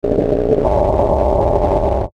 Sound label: Ascend Grind Static Low Hum; Blastwave